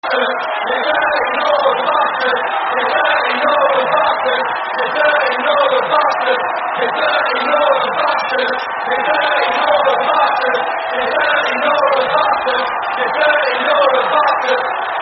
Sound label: Dirty Northern No Cheer, terrace chants; Portsmouth